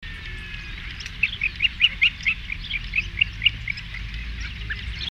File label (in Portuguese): canto do marreca caucau